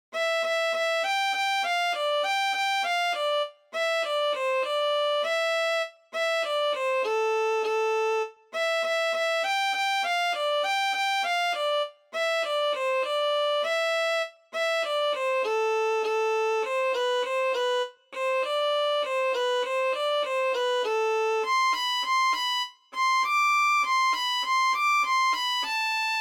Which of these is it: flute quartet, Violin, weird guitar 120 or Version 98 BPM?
Violin